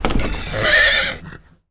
empire game settlement select military stables wood gate; horse